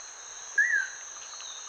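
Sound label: Manakin, bird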